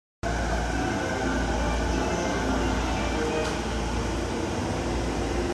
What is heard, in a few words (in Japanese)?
JR武蔵野線市川大野東, train sounds